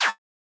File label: cancel, button